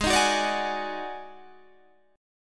D sharp Minor Major 7th Double Flat 5th G sharp

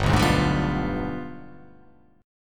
G 7th Flat 9th

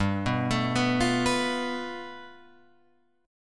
G 9th, Guitar Open F; arpeggiated

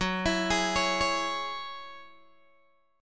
Gb 7th Suspended 2nd Gb Guitar Open G

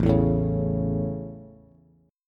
A Major, Bass; strummed

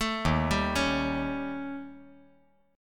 Eb 7th Flat 5th, Eb; 2,3,3,4; Eb7b5 arpeggiated; JGuitar

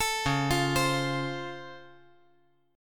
C sharp Augmented Major 7th, C sharp, chord; 14,13,13,15; arpeggiated; JGuitar

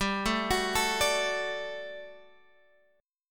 G Major 7th Suspended 2nd, Guitar, Open G; 17,14,16,14,15,x; down

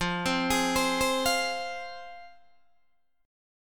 F Minor, Guitar Open D

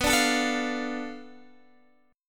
B Minor 7th Flat 5th B Guitar DADGAD

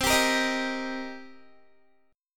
C Minor 7th C Guitar; Cm7 strummed; 19,22,22,20,19,x

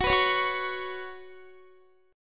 Gb Suspended 4th Gb, down, Guitar; Gbsus4 strummed

